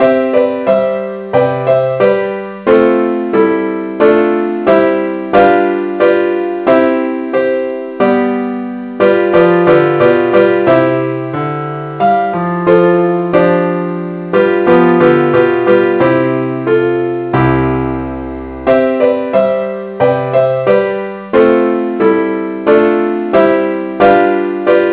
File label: gwalchmai keyboard Only key c